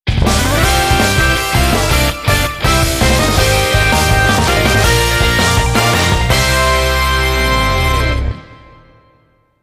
Music Game General event

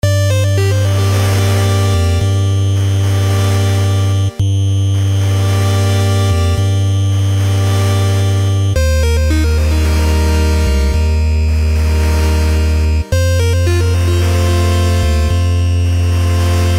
Dialog Loop; Sydney Hunter and the Curse of the Mayan

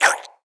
Sticky Slurpy Steal

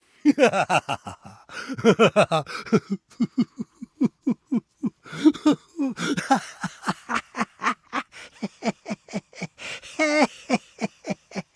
Attack grunt; We Happy Few

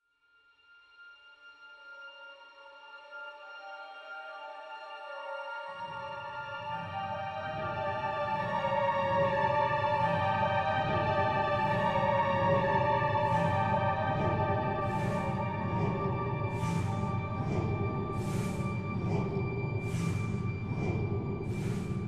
Ruins Front

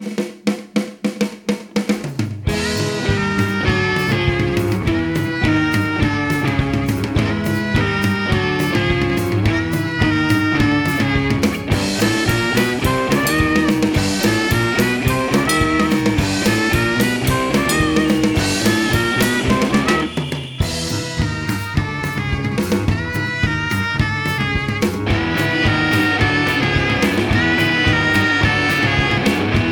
Menu Music; Pinguinos Biri Game; Android

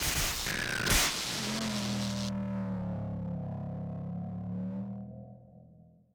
screamer layer; Joe's Diner; msx

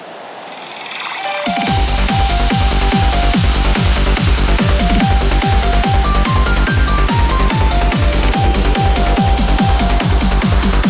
trance; forest pop party vol01

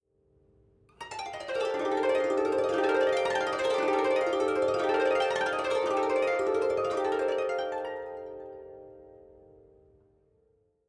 Savannah Music; kenshi windows gamerip 2018

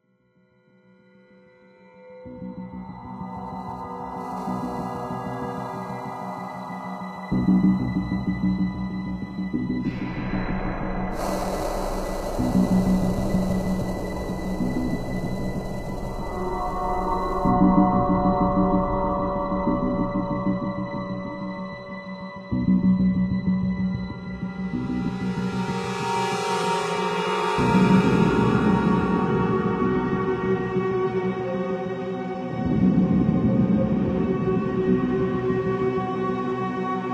descent music; Descent sounds

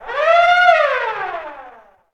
siren

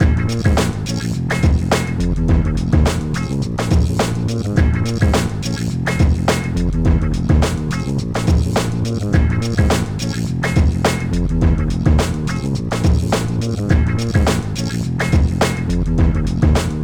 Load Loop; NBA Street; GC; PS2

